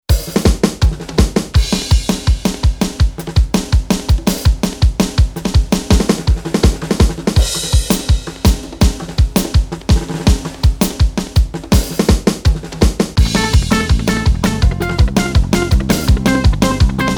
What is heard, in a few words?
frelach yamaha